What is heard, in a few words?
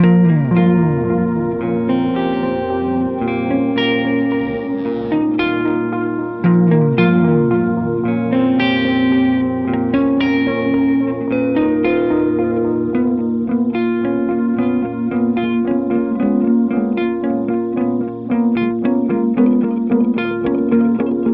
Black Hole Symmetry Delay